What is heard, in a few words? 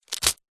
foley unopened greetings card in cellophane wrapper set down; zapsplat